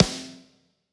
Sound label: Snare Smack Enhancer Stereo